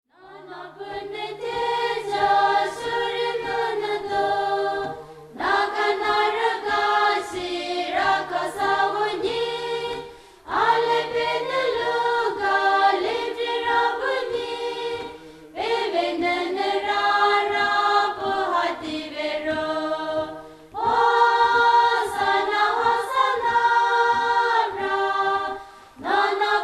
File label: carol